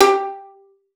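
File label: violin pizzicato G5; Xsample VOL-5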